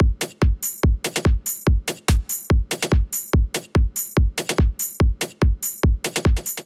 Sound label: Beat 144 bpm; MIDI Clips; Ableton; Skitter and Step; Power On